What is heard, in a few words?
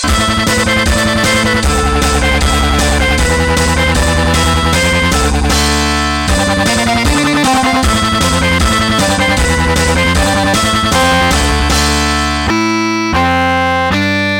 Death Metal; Tetris